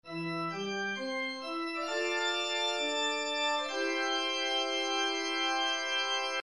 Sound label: church organ; nintendo dragon warrior 3